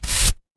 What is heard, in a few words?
paper fabric tear